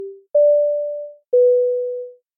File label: ting tong